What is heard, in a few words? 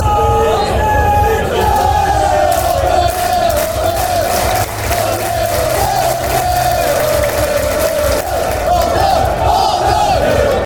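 fanchants, football chants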